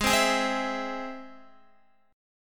G sharp Augmented G sharp Guitar; G#+ strummed; x,11,12,13,12,12; JGuitar

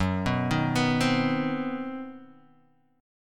C Minor Major 7th Flat 5th F sharp, chord

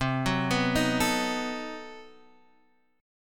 B 7th C; B7/C arpeggiated; JGuitar

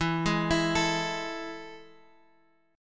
E Major Add 11th E, chord; E Add11 arpeggiated; JGuitar

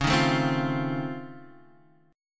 F 7th C sharp; strummed; chord; JGuitar

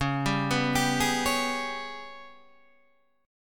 G sharp Minor Major 7th Double Flat 5th, chord; 9,10,9,12,9,9

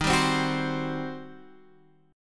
G Major 7th Suspended 4th Sharp 5th, Eb

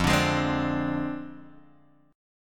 F Minor 6th Guitar Open D; 3,5,6,6,5,x; down; chord shape

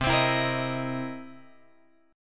C 7th Sharp 9th C

